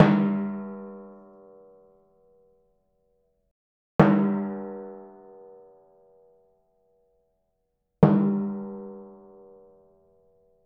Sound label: Timpani; 膜鳴楽器; 打奏; Timpani-マレットによる違い